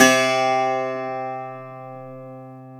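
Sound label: BZ F C, bouzouki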